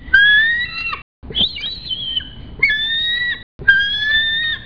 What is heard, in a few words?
bald eagle